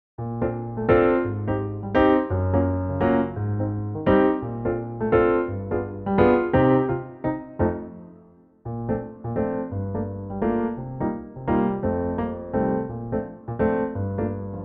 Bb piano

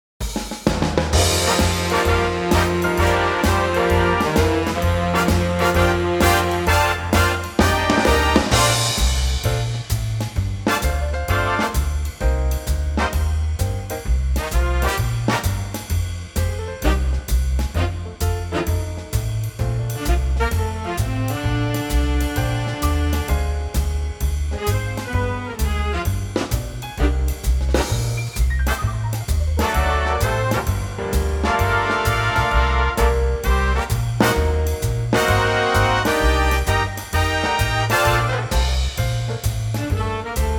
Bb big band

